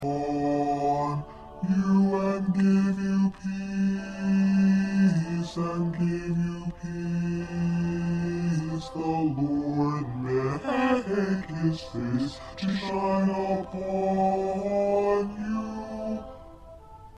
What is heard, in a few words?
Bass